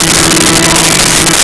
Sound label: Pipe Organ E4; Nintendo; July 2020 Gigaleak